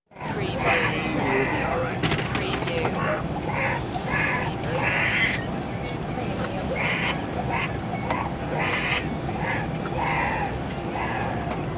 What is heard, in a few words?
Baby Cry Plane